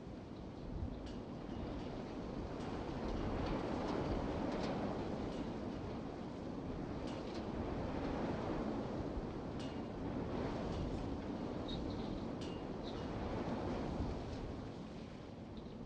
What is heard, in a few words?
bkg day, ambient background; STALKER; IWP